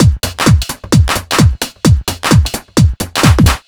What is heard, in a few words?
Drums, 130bpm, Nu Dance Electro; Zero-G; Soundclash; Combi Loops; Chicago Jock